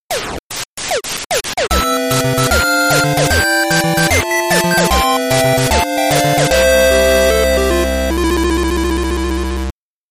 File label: Next Door Jingle